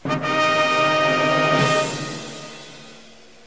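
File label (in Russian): звук фанфар с оркестром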